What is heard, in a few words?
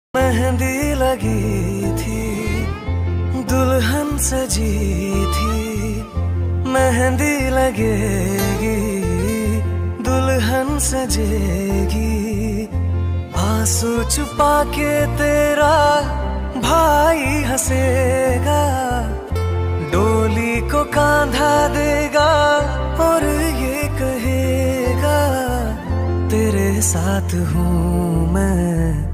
Song Ringtone